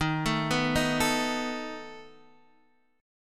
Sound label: A Suspended 2nd Flat 5th D sharp, guitar